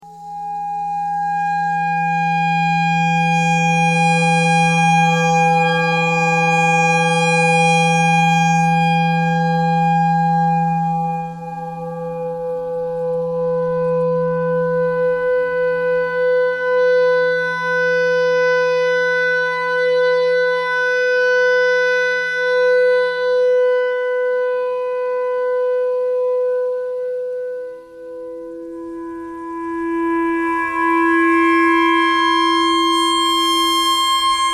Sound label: clarinet notes e clarinet thread noise